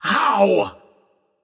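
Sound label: nonverbal ouch; smite; voicepack; merlin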